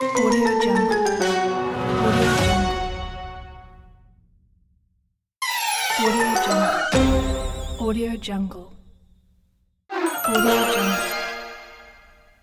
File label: lose game